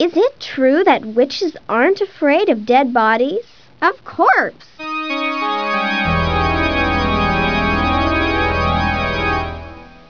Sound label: corpse; halloween